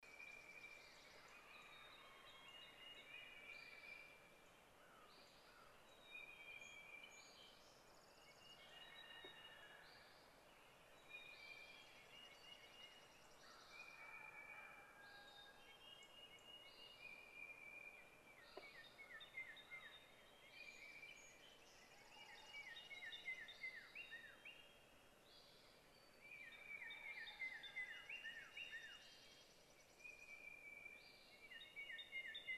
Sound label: Birds Sing; Sapphirelakes; vtour